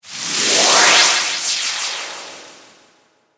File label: Space Whoosh Ascend, Space, Whoosh, Ascend, Descend; 5.1